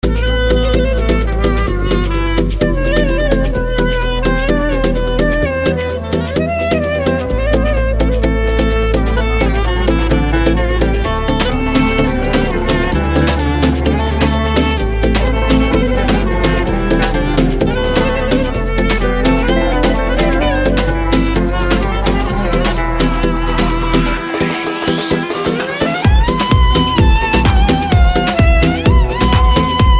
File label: klarnet